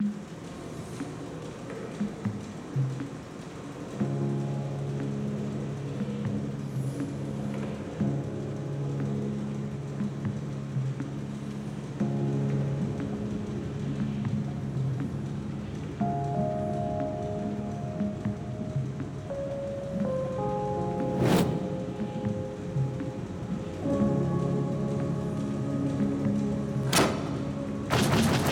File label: Piano House C (delayed drums and droning synth); mcsm music; Netflix